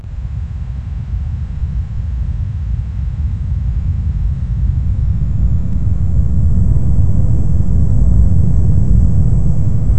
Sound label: deep volcanic rumble, volcano